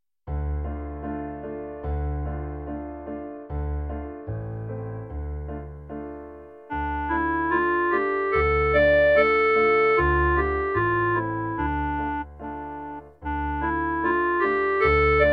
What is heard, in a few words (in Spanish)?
CLARINETE; Come and sing together